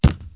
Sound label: wood land; HereticII Demo; Player